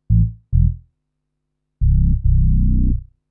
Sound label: SNTH BASS TEKNO 140 A